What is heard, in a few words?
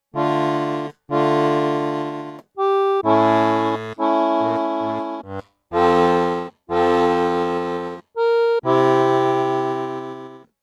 c pythagorean